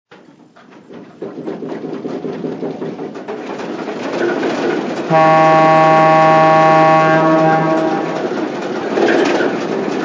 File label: Alco PA